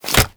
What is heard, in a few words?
Nunchaku Equip; Warframe; Tenno